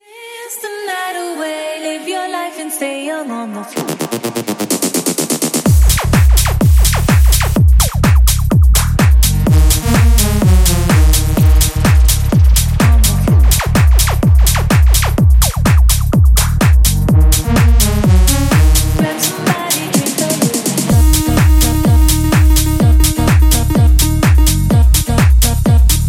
Tech House Rework; Remixfreakz

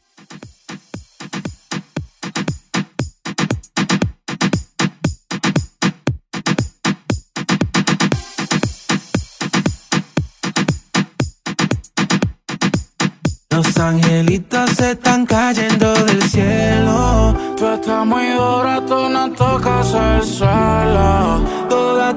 Moombahton Redrum

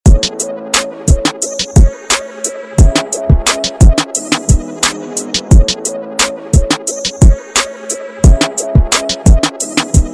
Hiphop Redrum

